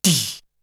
Beatbox; ZERO G - Datafile DISC 3

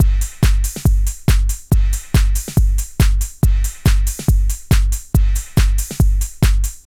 LOOP, Tekno Industrial; East West

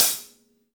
V-Pop Closed Hi Hat, Roland BK-5

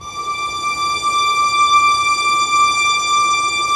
STR SEC; Sec Mute St; Roland - LA Composer vol. 1

